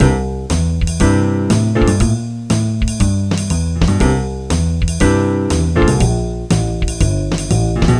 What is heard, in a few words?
loop